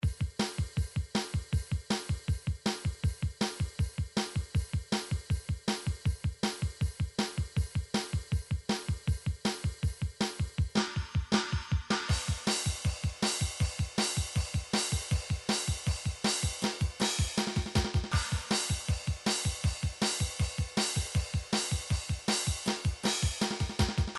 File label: drum midi files rock